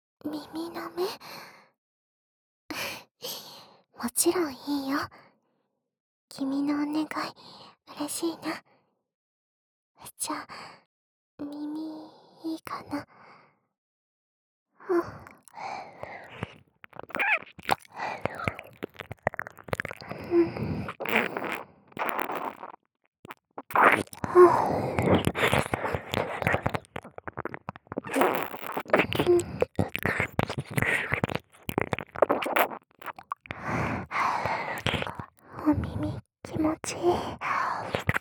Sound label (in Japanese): 耳舐めボイスコレクション